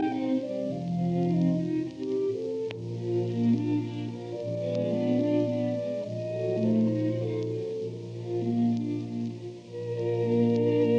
Octet in F major Adagio; Schubert

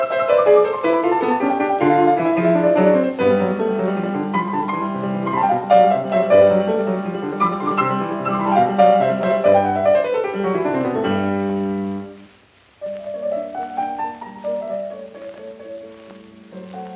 Piano Sonata in C major Allegro con brio; Op 2 No 3; Claudio Arrau

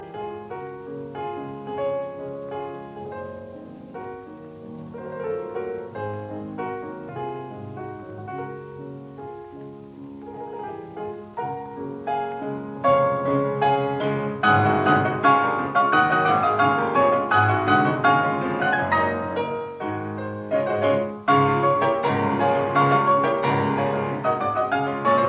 Hungarian Dances in D flat major in A flat major; 21 Hungarian Dances WoO 1 No 6; Wilhelm Backhaus

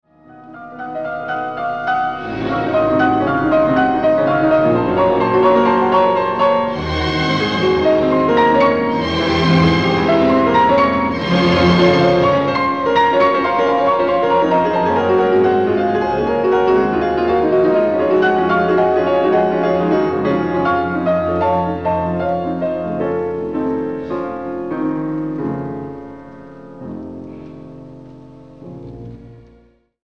Piano Concerto No. 2 III. Andante; Brahms

